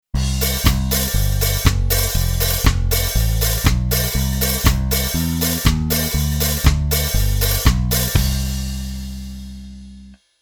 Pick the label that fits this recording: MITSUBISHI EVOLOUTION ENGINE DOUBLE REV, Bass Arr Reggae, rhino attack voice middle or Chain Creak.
Bass Arr Reggae